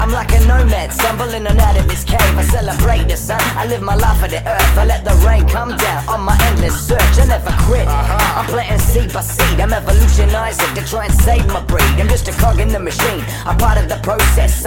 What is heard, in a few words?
funny dub